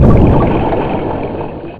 torpedo, weapon

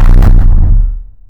heatray fire, weapon

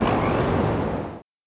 hovercraft move

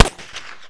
spike fire

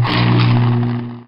gravity fire, weapon

